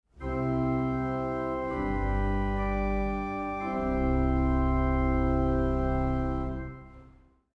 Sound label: Piano